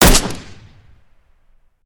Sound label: sks shoot, weapon; metrocraft